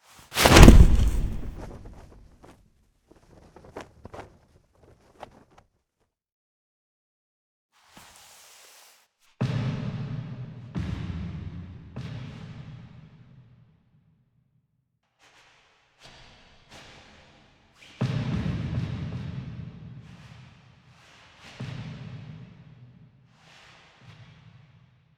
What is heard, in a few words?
Finale Foley; Cinematics